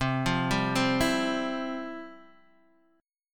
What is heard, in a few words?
F Major 7th Suspended 2nd, chord